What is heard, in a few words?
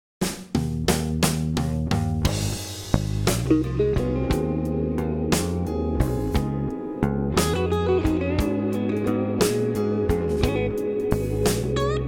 blues riff